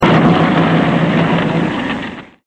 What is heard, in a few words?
TRUCK GO